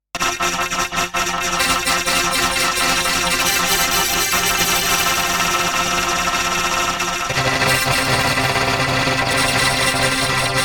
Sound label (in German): Emu EIV classic und Ultra Granular synthese Four Voices Octaves Longer Aux Decay